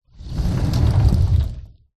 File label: household cupboard door roll slide open